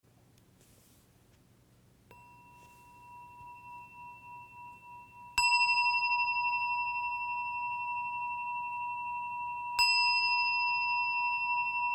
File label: 1 min sounding bowl